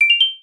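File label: remote connect; fluke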